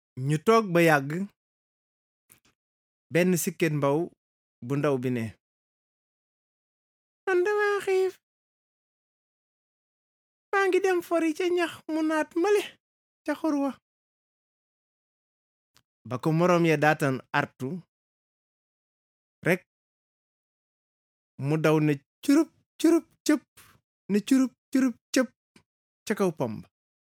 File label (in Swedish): bip